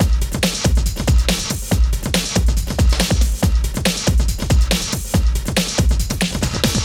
Loops BB 140, BreakBeats; Vengeance Samples

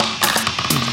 Fill Ins 128BPM; Vengeance Samples

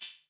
Open Hihat; Vengeance Samples; VEE Cymbals

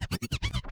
Transform Med, vinyl record cuts longs

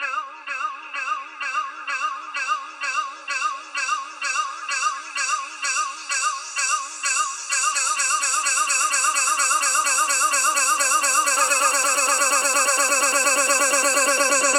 128BPM Du Du Du 8Bars, FX Fills; Vengeance Samples

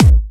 BD Punchy; Vengeance Samples; Bassdrums

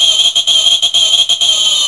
VFX2 Loop 128 BPM

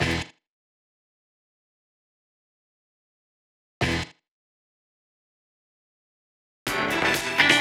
126BPM Cutted; Vengeance Samples; Tech Freak Kit

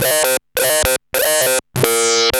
Arp E Line, ARP Odyssey; musicradar-synth-samples

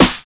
Snare, trap

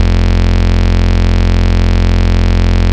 Root F, Bass

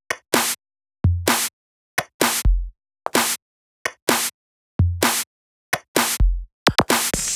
Neon Drums 2, 128BPM; Vengeance Samples; Neon Kit

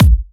132BPM TomTom Kick; VFH1 132BPM TomTom Kit

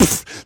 Beatbox Snare, Human Beatbox

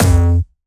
F2, Jumpstyle Kick; Bassdrums; Jumpstyle Multis; vengeance essential club sounds-3; VEC3 Bassdrums

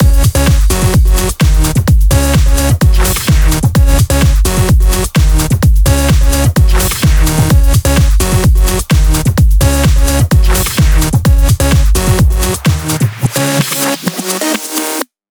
128BPM Mix; Vengeance Samples; Rocketeer Kit

